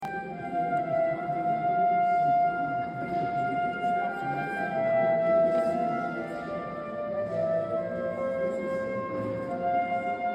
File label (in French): EGLISE NOTRE DAME FLUTE; CHEF BOUTONNE 79